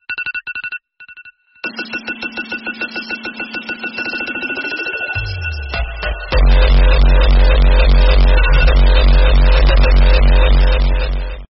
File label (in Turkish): Alarm sesi; uyanamayanlar icin